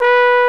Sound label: BONE, TROMBONES; Greytsounds VOL-1